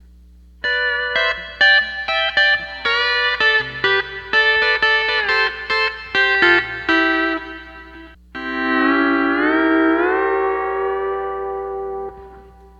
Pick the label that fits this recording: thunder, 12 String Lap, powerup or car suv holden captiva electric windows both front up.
12 String Lap